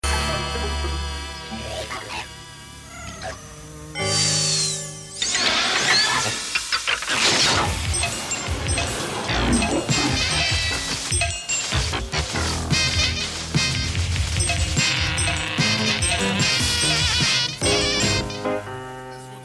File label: Ident; Dawna tv